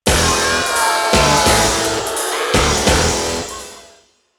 Jingle, Try again!; rayman raving rabbids playstation 2

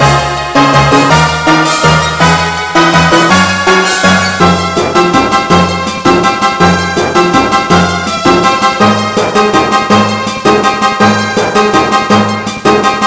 Fanfare Battle Clear

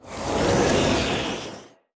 Ratcaller Attack

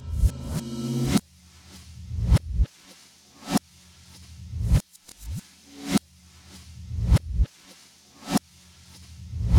Speaker Mic Slow Jazz Loop

